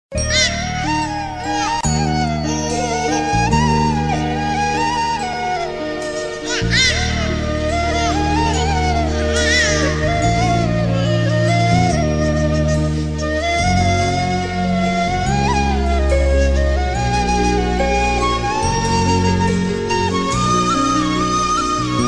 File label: mother love bgm emotional; nani movie; mahesh babu